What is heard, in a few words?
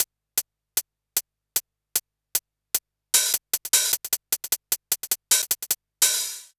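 COO hi-hat, drum loop; Big Fish Audio; Uncivilised G'rooves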